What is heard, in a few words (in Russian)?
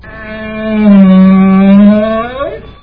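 крик настоящего верблюда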